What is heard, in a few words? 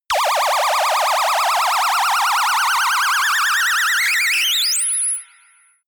warp load